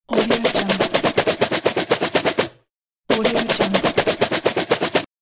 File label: saw; catsoundstudio